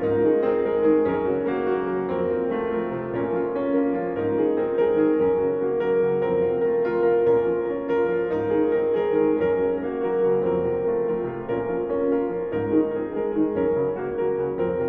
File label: Canto Ostinato, Minimal Piano